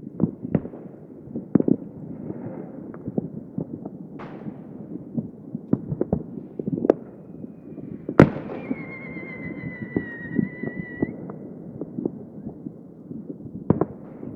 firework; best service Blue Box

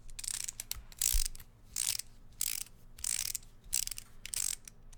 charge; Apotheorasis

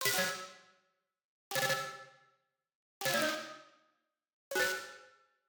Digital Click Negative; boom library; The Interface

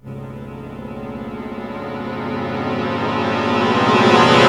Eerie Music Sting; SFX Kit; Foley - Misc

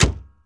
99 Mustang Hood Close, Automobiles

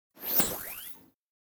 Thin Turn On Off Button; Hollywood Edge; sound designer's toolkit v2

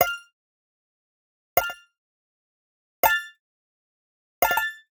Digital Click Neutral

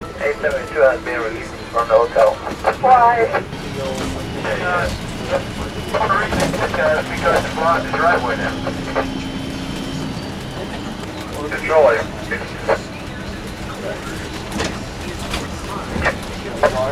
bus hotel shuttle LA, street traffic; best service Blue Box

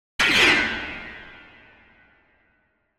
Piano Jarring Piano Hit, High Tones - Double; Hollywood Edge; sound designer's toolkit v2

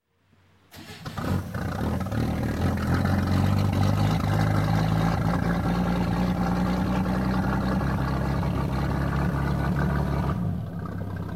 Boat Inboard - Start, Idle, Stop, Marine; Greavette; Sound Ideas; Series 2000

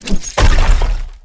Crystal Mantis Heavy Attack; Blind Quest - The Frost Demon

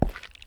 boots wet hard; Apotheorasis

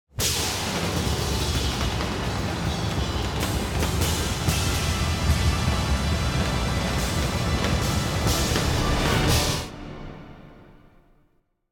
Crazy Drums - Finale Cacophony - Long; Hollywood Edge; sound designer's toolkit v2

